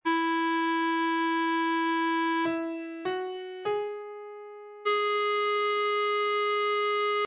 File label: e major